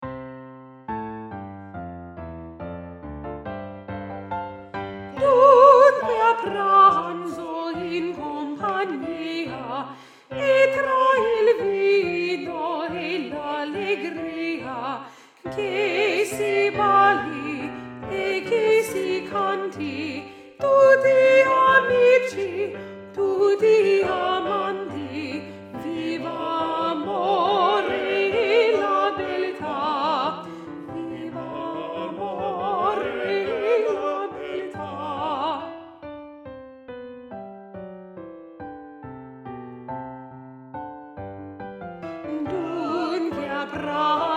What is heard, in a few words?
Alto